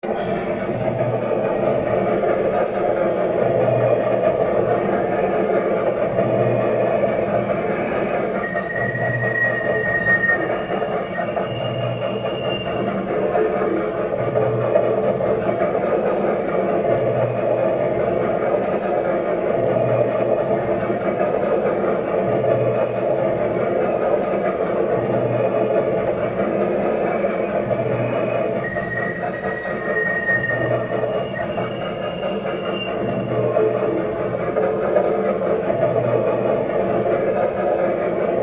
Arcade Train Ride, Technology, Arcade, Train, Ride, On, Run, Off; Arcades; Blast Wave